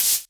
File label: Shaker; Equipped Music; Loungin House